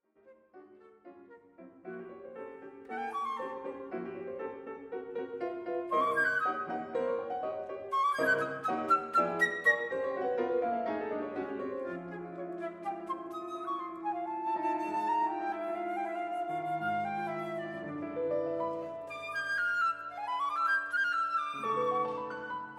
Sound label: two pieces for flute and piano